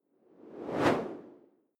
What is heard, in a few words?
whoosh simple